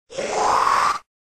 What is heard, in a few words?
Monster SFX